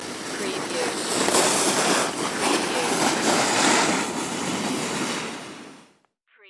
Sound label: Snowboard By